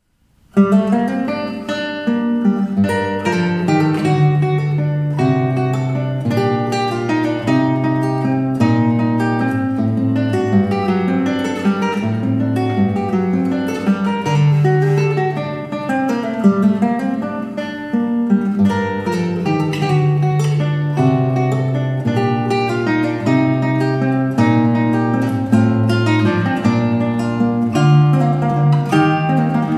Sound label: Menuet; Weiss